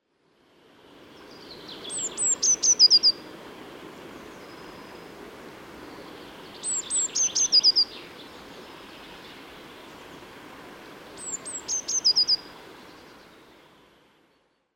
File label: song, park; bebrenes parks; e.racinskis